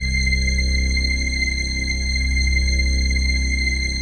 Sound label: PHASE PAD; PHASEPADS; Best Service; XX Large Pads